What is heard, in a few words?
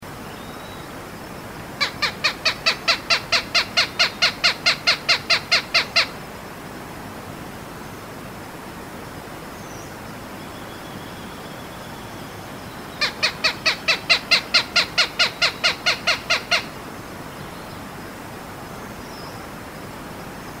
Accipiter bicolor, Roodbroeksperwer; roofvogels; Accipitrinae; Accipitres; Accipitidae